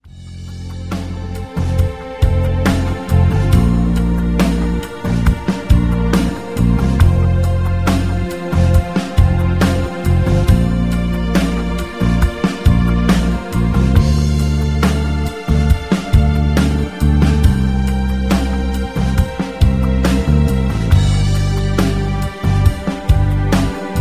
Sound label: rhythm